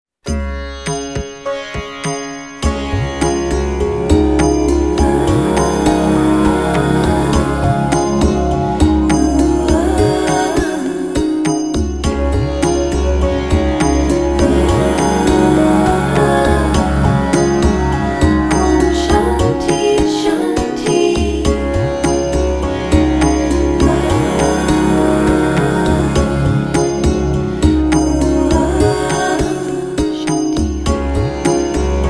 Shanti OM

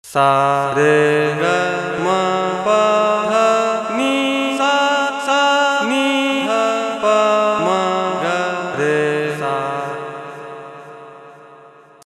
sargam

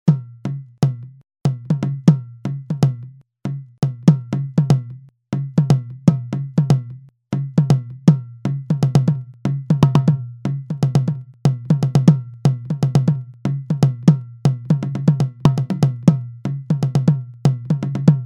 nishan, Instruments

